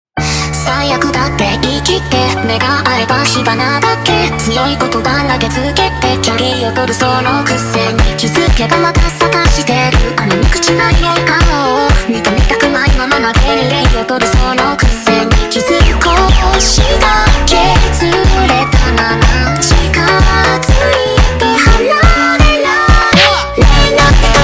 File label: Montagem slowed